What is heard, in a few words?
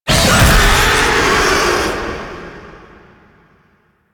Finisher Pre Death; Warframe; The New War; Archon; Boreal